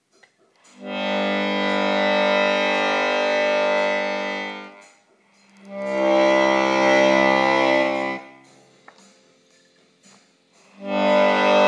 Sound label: the sound of the unknown accordion